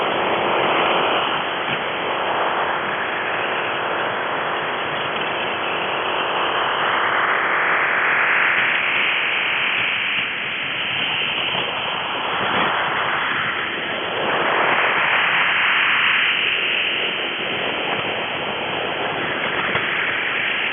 OFDM PSK. BW = 2K80E. 60 TONOS. Pilot line at 3300 Hz. (CIS-60 High Data Rate modem)